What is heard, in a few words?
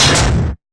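industrial kit snare; Giga Samples Collection; Dr 202 Kit 1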